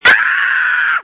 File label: Scream